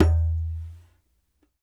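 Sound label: Large Dumbek Medium; Sony CS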